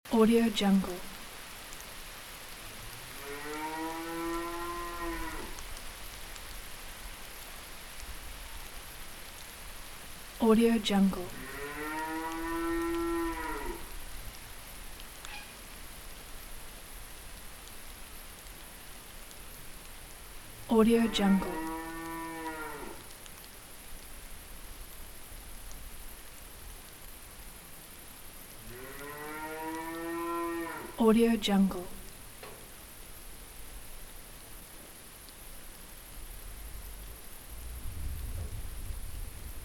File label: cows in barn in rain loop